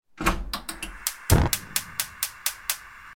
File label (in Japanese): コンロの火をつける